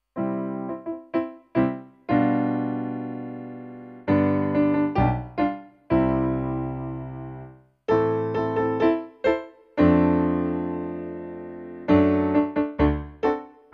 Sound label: Piano